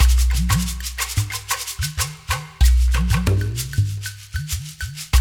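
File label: 93 bpm, earth beat; Spectrasonics - Supreme Beats - World Dance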